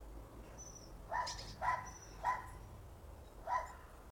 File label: loudest dog